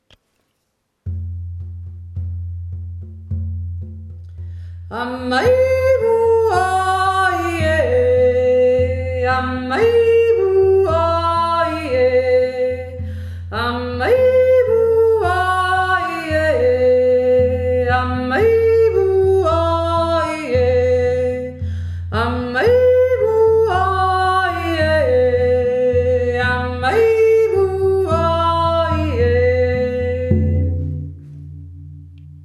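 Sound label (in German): pygmäen jodler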